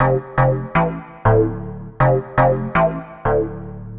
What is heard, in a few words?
Digi Bass (120BPM), retro house